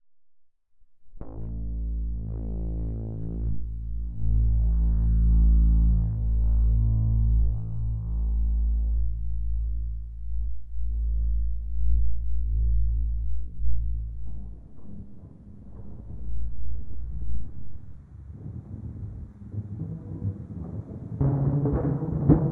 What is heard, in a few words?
acoustic guitar; Temporal; microacoustic music